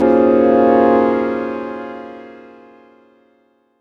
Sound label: D#m7+9, Chords; Electronisounds